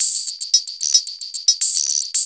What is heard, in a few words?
LOOP; E-Lab; X Static Goldmine 2